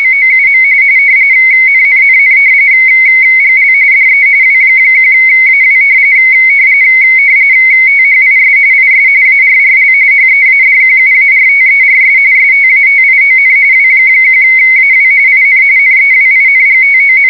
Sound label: w1aw rtty